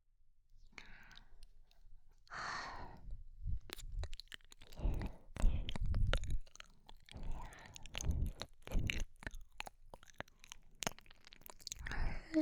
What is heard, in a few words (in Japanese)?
ぺろぺろ 右